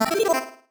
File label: marimba dice; ゆめ2っき